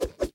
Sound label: lasso catch; toy story; jessie